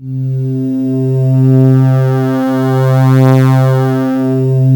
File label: PAD Prophet 5; Best Service Gigapack 1